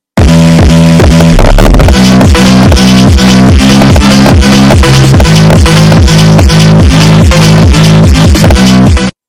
speedphonk

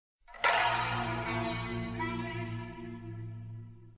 chime; inuyasha

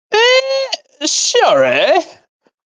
errrrrrrrrr

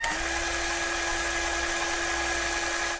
film roll

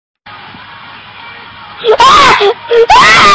kid turns blue and starts to scream

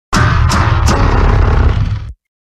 mechagodzilla laugh

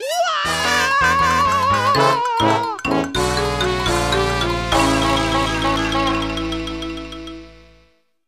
falling game over; mario

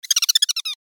cute squeek

